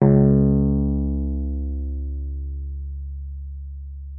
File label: WURLITZER C#2; Xsample VOL-1; WURLITZER RS